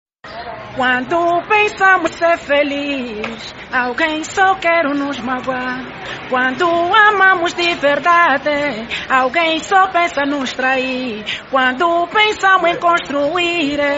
angolano cantando

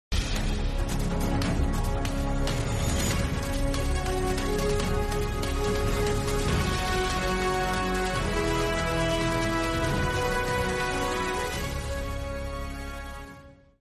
telejornal intro

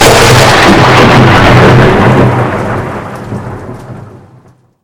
trovao forte alto

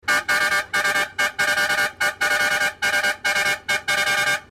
buzina

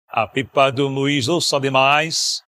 cantando

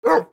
cachorro latido

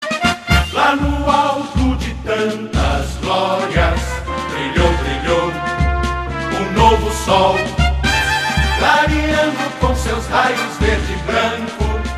hino; coritiba

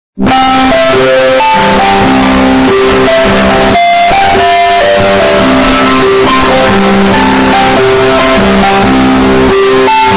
alarme estourado

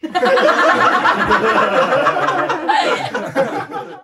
risada de reacoes

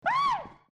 ai gritinho

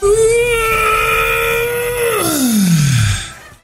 uhhh ahhh espreguicando

